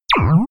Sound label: blob laser; krieg-waffen; soundpool